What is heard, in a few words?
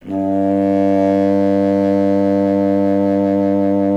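wind contrabassoon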